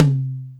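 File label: Md Dbl, Alesis D4 drum machine tom; 300 Drum Machines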